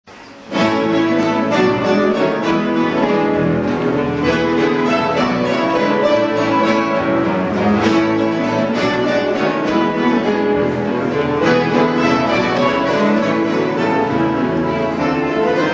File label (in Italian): orchestra chitarre; Bari; De Falla; Torroba